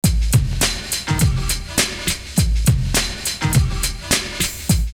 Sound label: Fat Rap 103, drumloops OldSchool; X-Static Goldmine